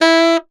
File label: Tenor Sax Portato E3; DirectWave; Varazdin Orchestral